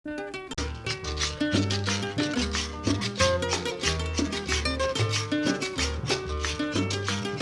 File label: Cumbiamba